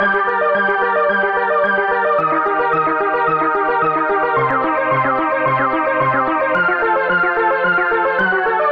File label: old west saloon level, Electronic Loops